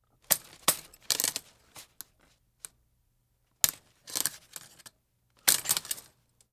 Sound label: Umbrella Movement; Fairlight Sound Lib